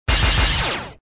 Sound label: Sound SFX, flipper; sttng